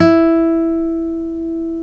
folk steel, guitars; Spectrasonics; Hans Zimmer Guitars VOL-1

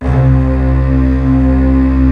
HALL STRING; Greytsounds; Scott Peers Sound Engineering

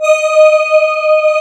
CMI ORGAN

